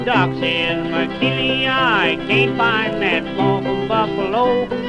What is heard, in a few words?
music; wu xing; earth